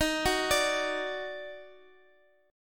D sharp Minor Major 7th D sharp, chord

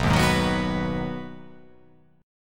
B 6th B down, chord

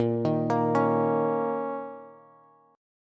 A sharp Minor Double Flat 5th, down, Bass; arpeggiated; JGuitar

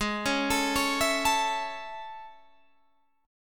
A Major Ab; arpeggiated

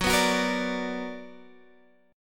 B Minor Major 7th

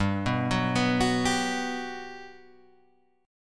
G Major 7th, G, down, Guitar, Open G

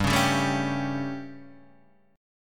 G Suspended 2nd Flat 5th G, chord; Gsus2b5 Chord {3 4 5 2 2 3} strummed

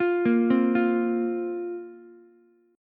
A sharp Suspended 2nd down Ukulele; arpeggiated; A#sus2; JGuitar

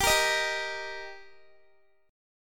G Minor Sharp 5th G Guitar, chord; Gm#5 strummed; x,22,20,20,19,x

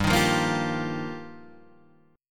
G Minor 7th Sharp 5th G, Guitar Half Step Down

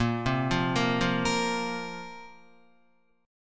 Bb Suspended 2nd Bb down Guitar; arpeggiated

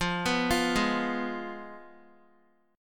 F Diminished Guitar; Fdim arpeggiated; JGuitar

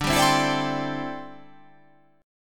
D 7th Suspended 2nd Suspended 4th D

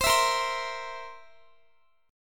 A sharp Suspended 2nd Flat 5th A sharp, Guitar; A#sus2b5 strummed; x,x,22,21,22,19

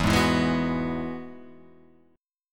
E Major Add 9th

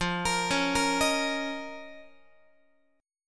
F 7th Suspended 4th Sharp 5th F down Guitar

